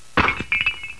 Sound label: BREAK